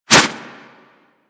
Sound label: Melee Swipe; Warframe; Kubrow